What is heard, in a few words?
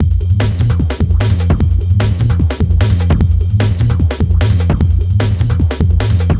Hardcore Breakbeat, 150 Fmin; Big Fish Audio; Hardcore Breakbeat Action; Trukin